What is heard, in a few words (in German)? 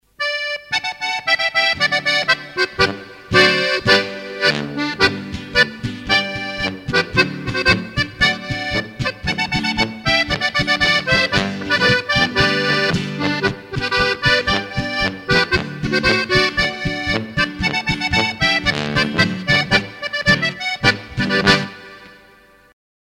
Plattler, Marsch